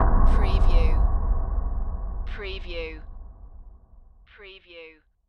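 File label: Production Element Hit Dark; Sound Effects Bible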